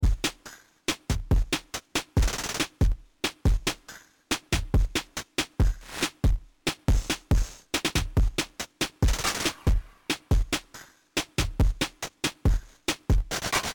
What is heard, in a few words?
multiphonics digital lo-fi engine wet; multiphonics cv 2 audio fx; adam pietruszko